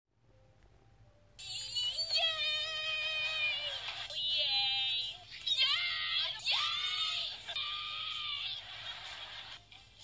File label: Yayyyyy